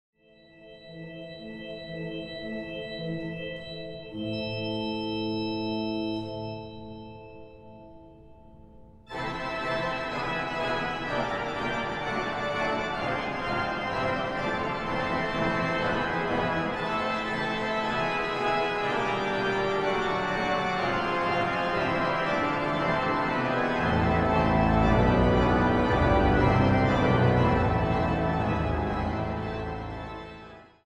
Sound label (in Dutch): Improvisatie Psalm